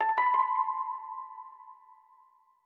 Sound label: ethno, 90, D